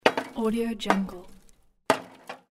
rusty metal junk hits with falling debris

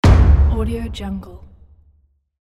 china taiko hit

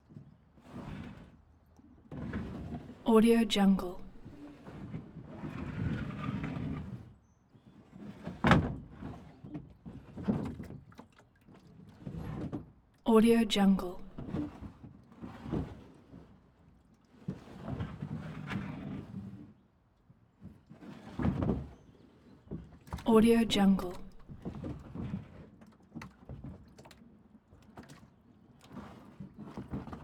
canoe plastic movement on dock

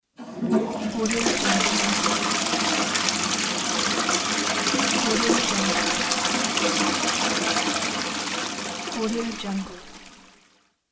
wc flush

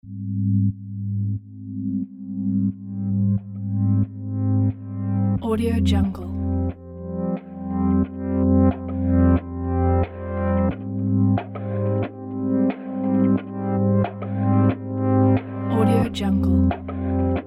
dream abstract hip hop

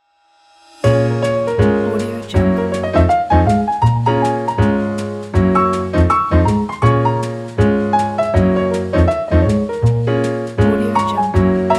the jazz logo pack

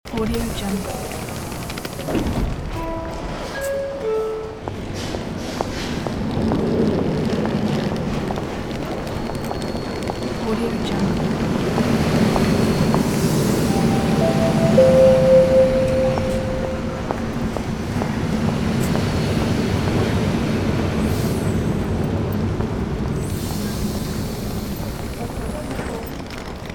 train station ambience loop; fxprosound

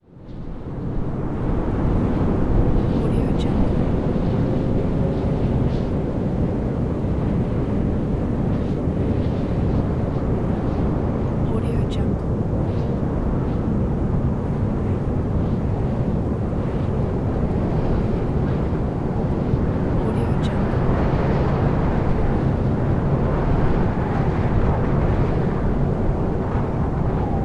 wind

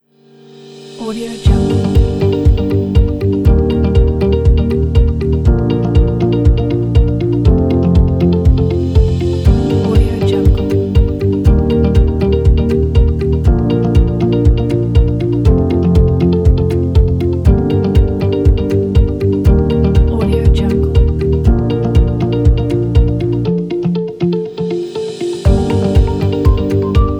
corporation party

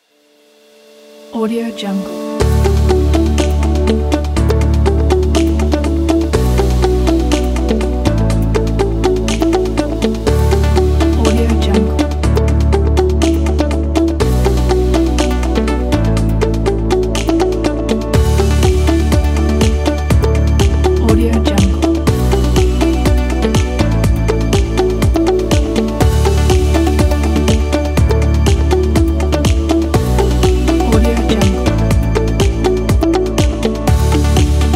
inspirational uplifting background

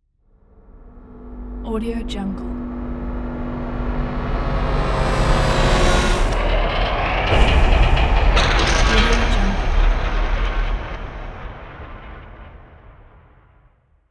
cinematic scary impact

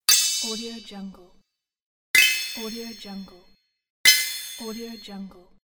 metal sparkling impact

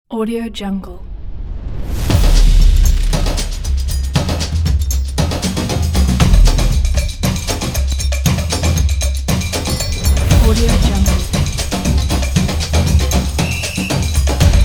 brazil samba; mrclaps

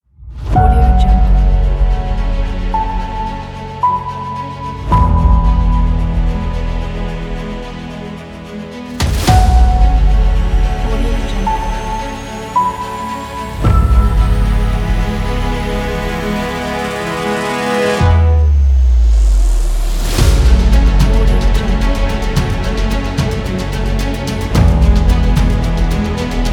epic adventure

